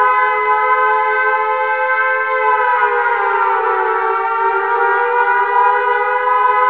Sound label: Air Raid; combatfs